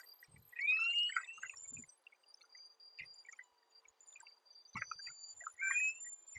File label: Chirp constant